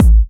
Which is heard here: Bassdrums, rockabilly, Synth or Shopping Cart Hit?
Bassdrums